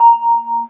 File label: elev, ambient tones